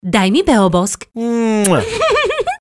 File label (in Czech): jingel